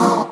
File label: Bb STAB; Loopmasters; Funky Underground Vol 2